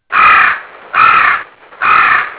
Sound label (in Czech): ptak